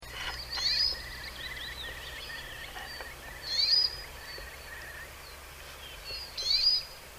Spinus spinus

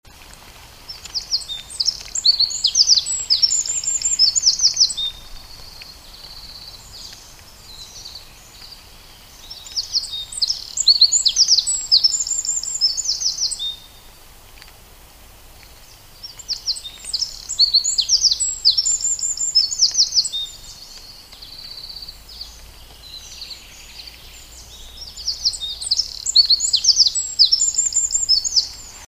Troglodytes troglodytes

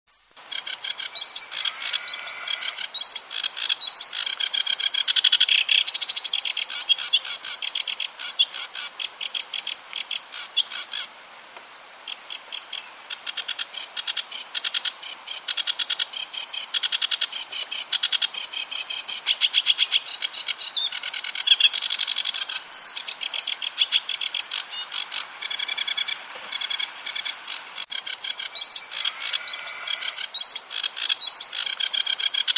Acrocephalus schoenobaenus

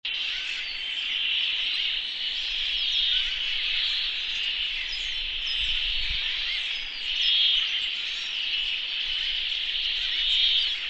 Fringilla montifringilla

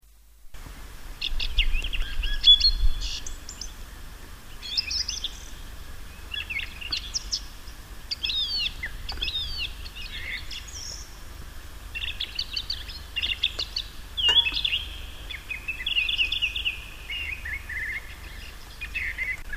Turdus philomelos

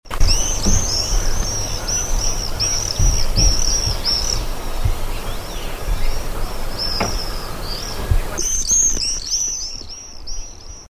Apus apus

